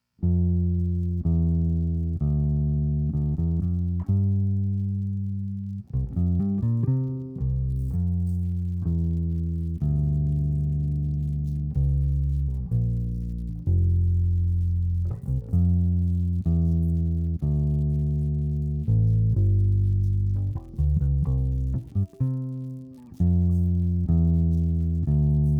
bass

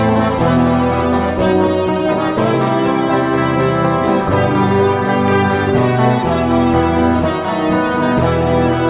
organ

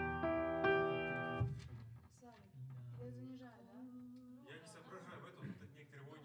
piano

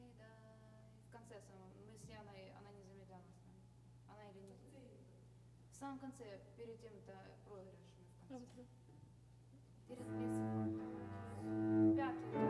Overhead

